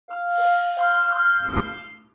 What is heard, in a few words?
Swipes; Electa Quinney